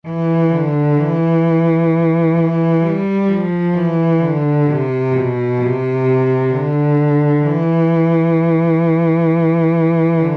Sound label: cello